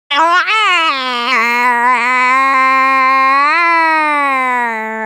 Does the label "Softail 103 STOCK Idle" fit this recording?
No